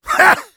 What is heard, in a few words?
laugh short; Heavy